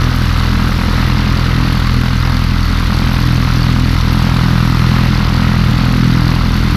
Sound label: Aircrafts Engines Jumo213A Prop; DCS